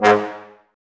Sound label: Brass, Woodwind Brass Ensemble